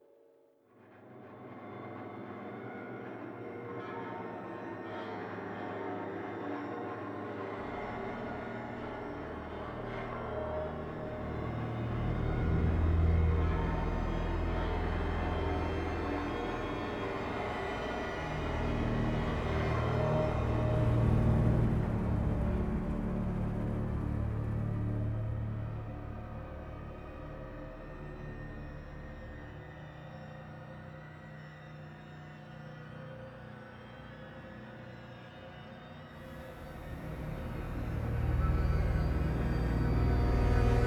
Default Loading Music

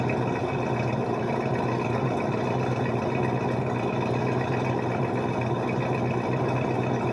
Riverboat Engine; angry birds rio

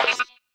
card error; through abandoned the underground city 2015 gamerip pc macos

